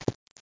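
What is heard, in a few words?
foot muffled; alienbreed3d2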